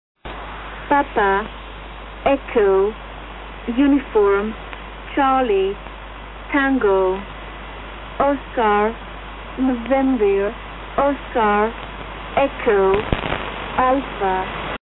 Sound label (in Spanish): emisora numeros, radio; E10; YHF